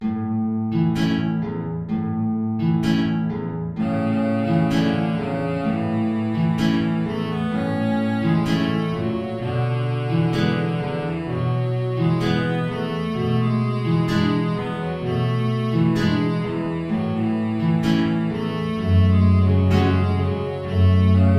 tango; albeniz isaac

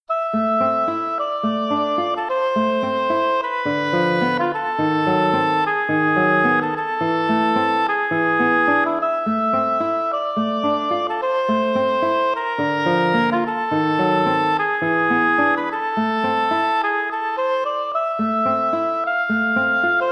melodia; nepomuceno alberto

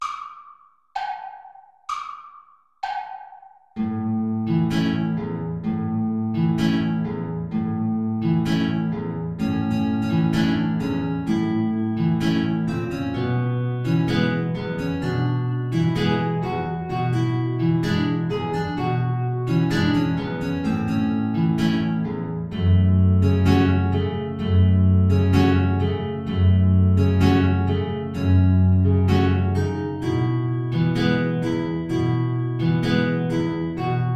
tango play along without guitar; albeniz isaac

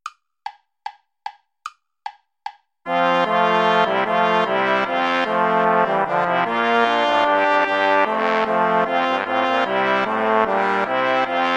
bourree play along without trumpet; praetorius michael; bourree iii

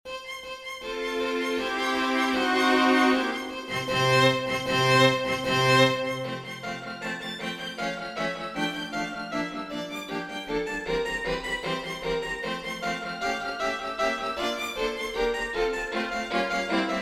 for string quartet; burgma ller johann friedrich franz; awakening the woods from tudes

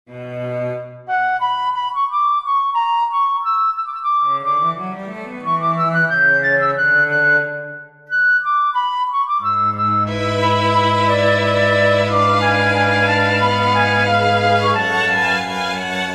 recitative for flute strings; bach johann sebastian; und sie den lobgesang gesprochen hatten

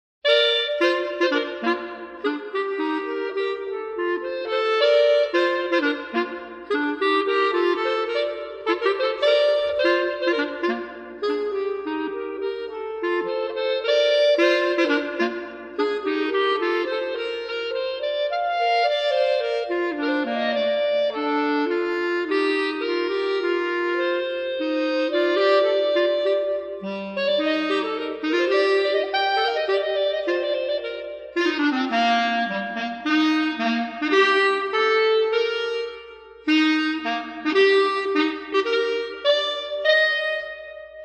version for clarinets; volante ilio

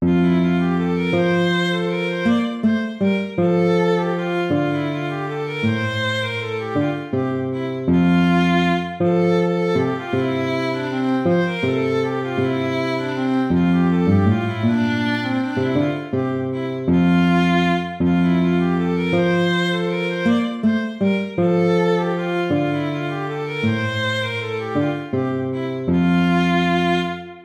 lute

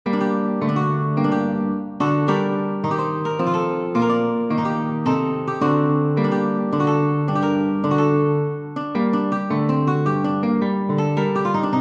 air minor, guitar duet

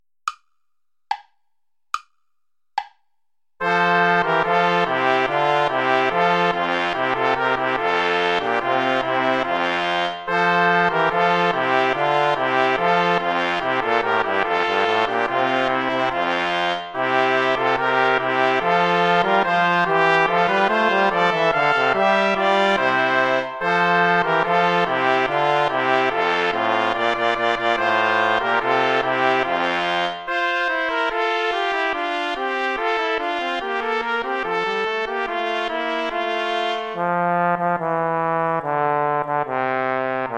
traditional play along without trombone; schma ckt den saal mit gra nen zweigen

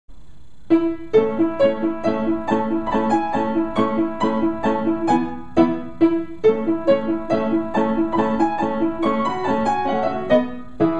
german dance; beethoven ludwig van

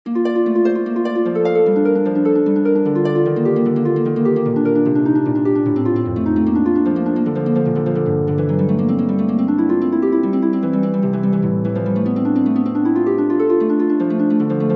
prelude major for harp; Johann Sebastian Bach

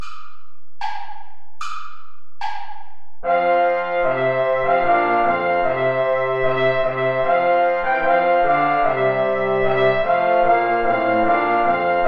play along without trumpet; friderici daniel; wir lieben sehr herzen

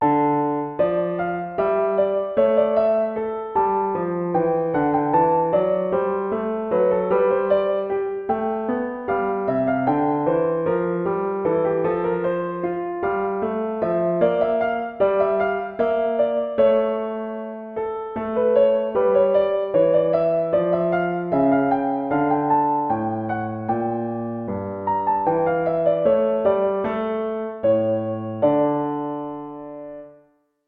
air, hornpipe

